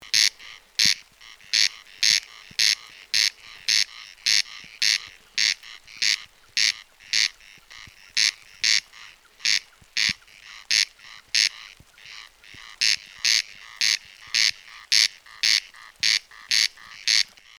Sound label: Corncrake